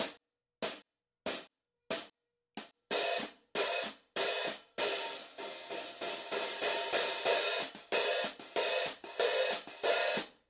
14 dark hi-hat pattern, paiste; masters

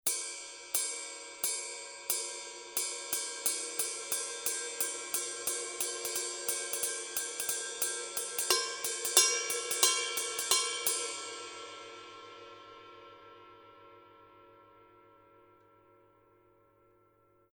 20 black heavy ride pattern, paiste; color sound 900

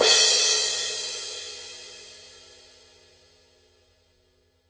17 thin crash edge, paiste 2002

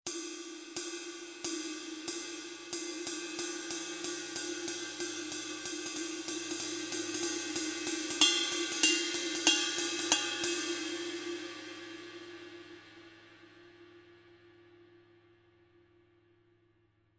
giant beat 24 multi pattern, paiste

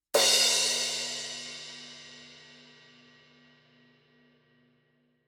formula 602 classic sounds 16 medium edge; paiste

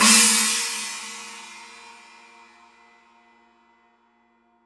18 china edge, paiste; pst 7

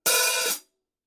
formula 602 classic sounds 14 heavy hi-hat open; paiste

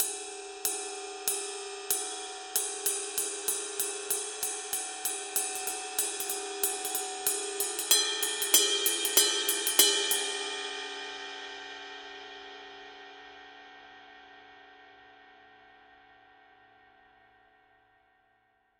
22 heavy ride pattern, paiste 2002

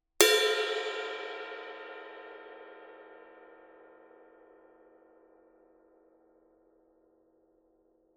master 21 dry ride bell, paiste; masters

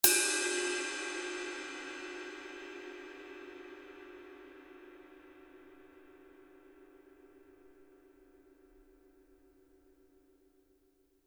giant beat 26 giant beat body, paiste

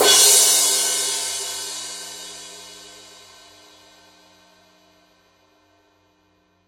power crash edge; paiste 2002

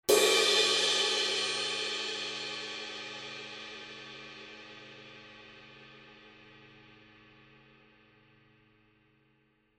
paiste signature 20 mellow ride edge

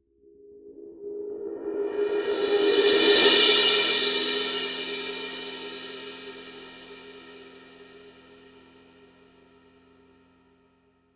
crash roll; paiste 2002

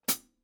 formula 602 classic sounds 15 heavy hi-hat chick; paiste

